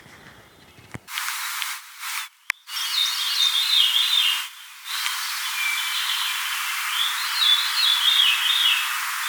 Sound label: NC Birdsong